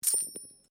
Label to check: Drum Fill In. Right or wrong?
Wrong